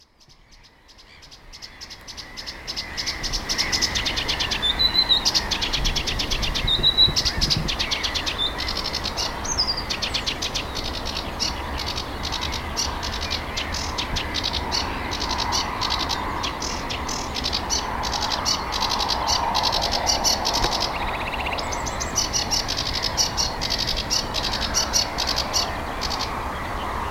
ruokokerttunen; linnut

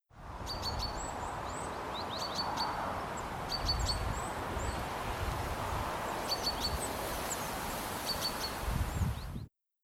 peipon varoitus; linnut

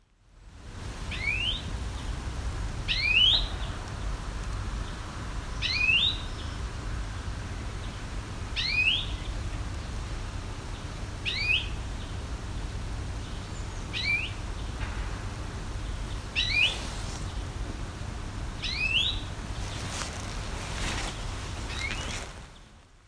viherpeippo; linnut

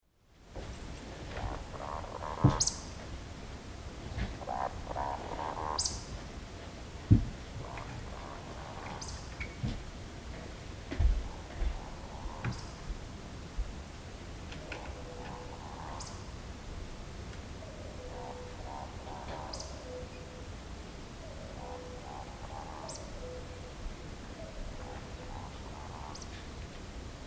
lehtokurppa; linnut

